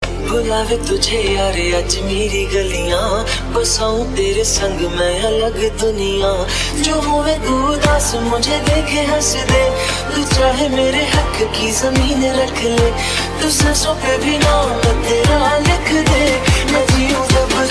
HighBass

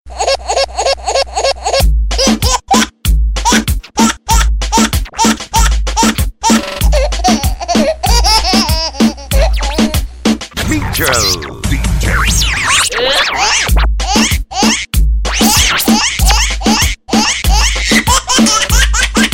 Baby Cry

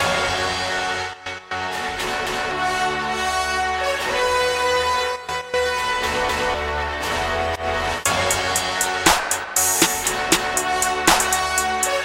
Trap

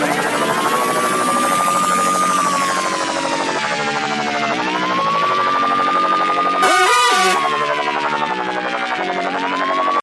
Alarm Phone

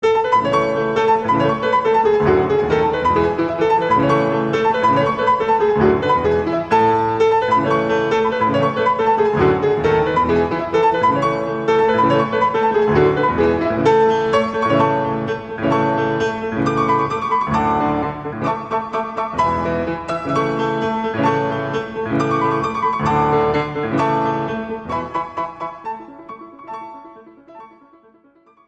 Sonata A Maj

